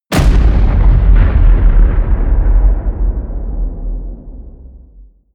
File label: air strike